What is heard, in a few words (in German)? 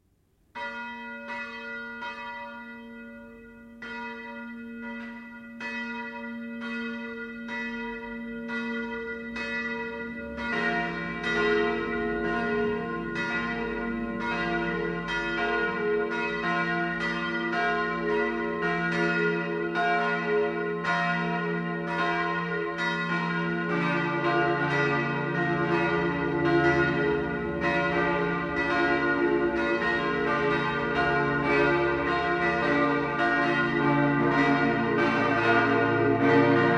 Glocken reformierte Kirche Hundwil AR